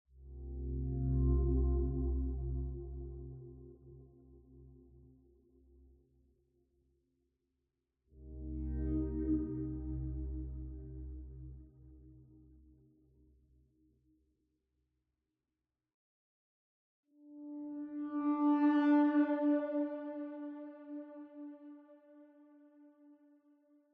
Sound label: Ambient; mass effect andromeda gamerip 2017